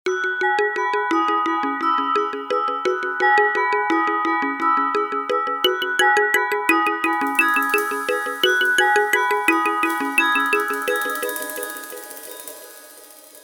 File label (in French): jingle